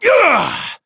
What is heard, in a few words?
Exertion